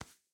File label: Hand Step; Game rip; MiSide